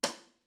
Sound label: snare2 rimshot mf, Membranophones, Struck Membranophones, Legacy Snares